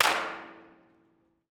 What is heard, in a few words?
Bridge 8 Hands Clap Hand Clap Sample (Shure KSM141 cardioid); Regents Canal Bridge Dalston